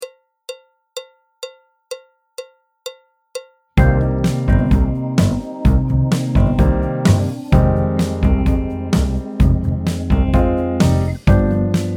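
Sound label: Tango backing track, Jazz; alto, trumpet, trombone; Jazz Syllabus Level 3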